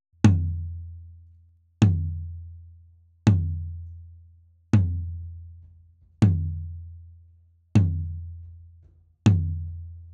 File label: tom II; md 421 kompakt; mikrofonu test